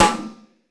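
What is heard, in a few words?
GATE snare; Crypton; DrumBase5000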